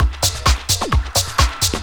drum 130 groove; Techno Trance Essentials

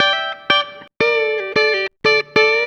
COUNTRY

